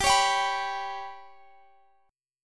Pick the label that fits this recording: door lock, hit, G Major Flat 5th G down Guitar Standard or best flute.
G Major Flat 5th G down Guitar Standard